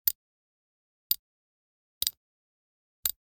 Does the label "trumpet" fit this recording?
No